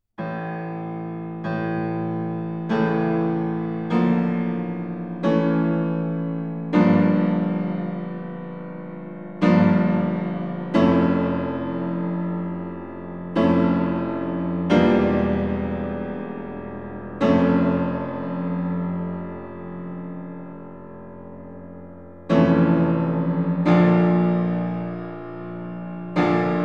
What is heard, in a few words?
Hymn; Fourth Way; Piano